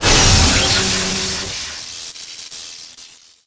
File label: Chain Lightning